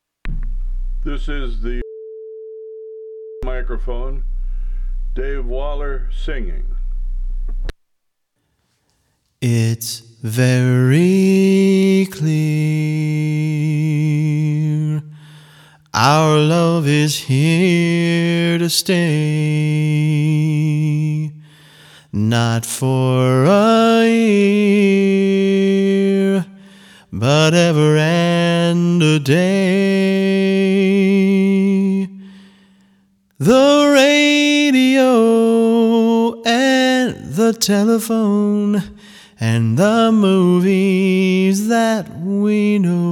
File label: X microphone beep